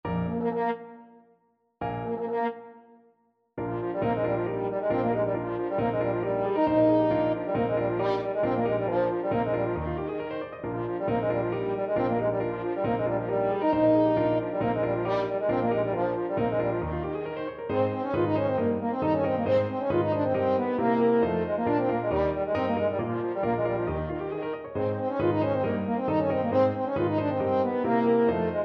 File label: french horn